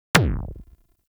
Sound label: Arch Gun Primary Beam Punch; Weapons; Warframe; Archwing; Hildryn